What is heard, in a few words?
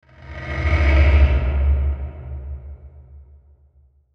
Stab Dark, Stab Dark Grind Delay; Stab Dark Low Grind Delay; Stabs